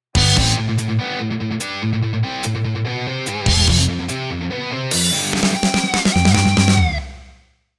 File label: guitar pattern